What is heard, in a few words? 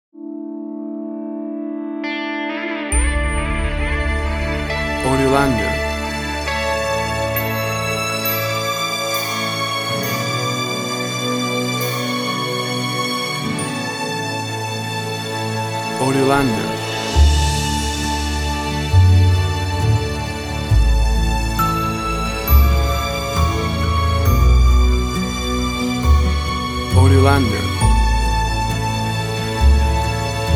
WITHOUT VOCALS